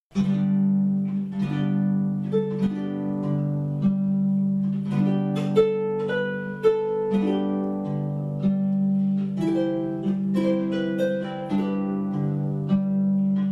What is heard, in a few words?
Celtic Wedding March, Harp